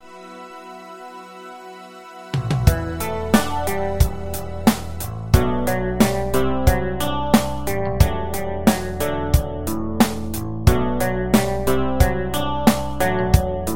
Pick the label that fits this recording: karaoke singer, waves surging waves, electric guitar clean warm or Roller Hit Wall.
electric guitar clean warm